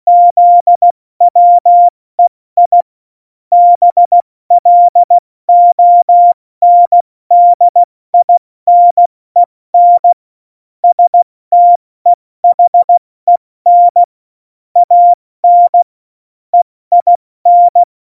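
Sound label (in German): Witz, Tempo 70, Morsekurs, cw morsen; Witze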